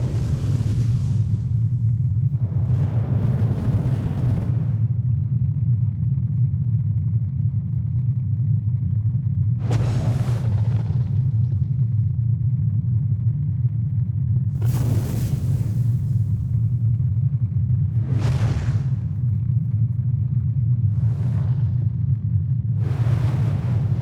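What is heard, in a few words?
ambient solar storm; Stellaris; cosmic storms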